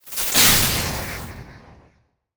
Electric Magic Lightning Zap; Cielonos

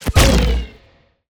Bow RELEASE epic; Cielonos

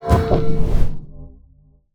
Spear SKILL cast; Cielonos

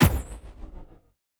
LASER Impact Small; Cielonos

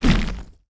magic cast earth; MagicArsenal

